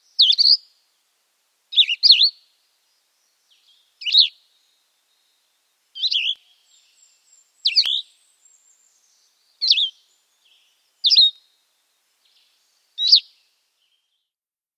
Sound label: Cassins Vireo